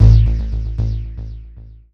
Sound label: dance, bass; Techno Trance Essentials